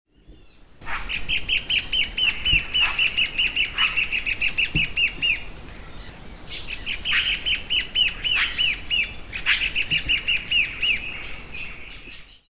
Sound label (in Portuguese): Myrmotherula axillaris Choquinha de flanco branco